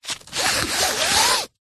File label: fabric nylon cloth movement in hand scratch; zapsplat